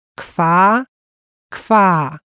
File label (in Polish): kwa kwa